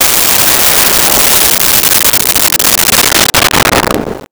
Missile, Weapons; 1001 Sound Effects

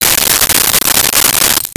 Keys shuffling, Foley

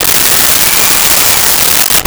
Electric Drill Tighten; Tools

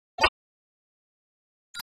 Sci Fi Doorbell, Sci Fi Phone Ring; HB03 - Hanna Barbera; Jetsons

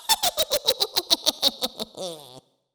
Witch Cackle, Horror

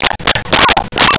vox droid; pdroid

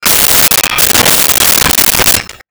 Pots Put Away; Kitchen; 1001 Sound Effects

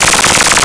Arcade Movement; Video Game Sounds

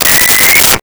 Walkie Talkie Feedback; Communications

